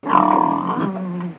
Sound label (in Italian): maiale